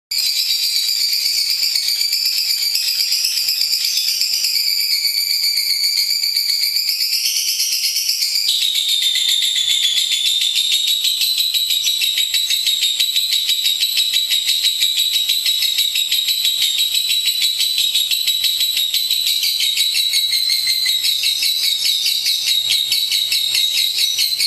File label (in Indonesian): audio lovebird ngekek panjang